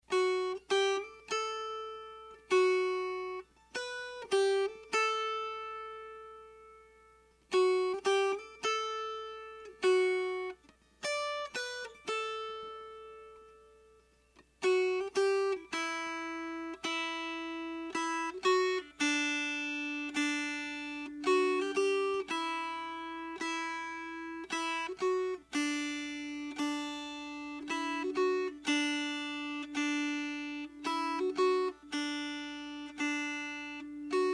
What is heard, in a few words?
Melody; Fa la nana, bambin